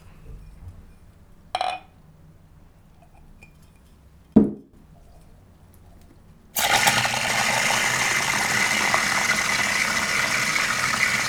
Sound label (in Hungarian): szorp keszites, szodaviz